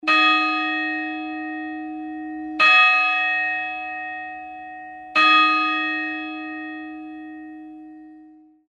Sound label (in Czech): zvon; tomas; zvonohra